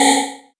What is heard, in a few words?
Buchla Modular percussion; Buchla Modular System 200; Drums